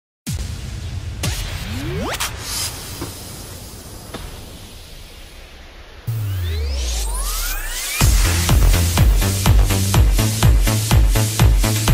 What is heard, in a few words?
dance music